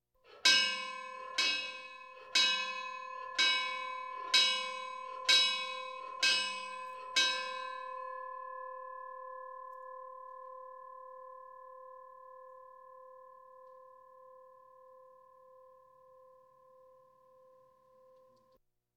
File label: Police, Fire engines